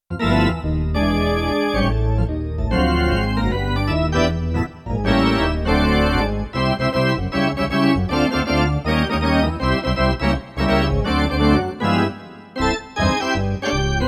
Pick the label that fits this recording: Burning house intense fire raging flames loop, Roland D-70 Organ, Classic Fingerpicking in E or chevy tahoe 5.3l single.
Roland D-70 Organ